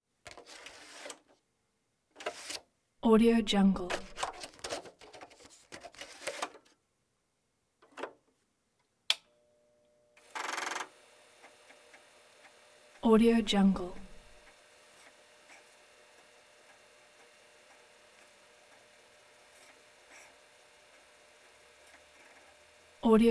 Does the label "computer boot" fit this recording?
Yes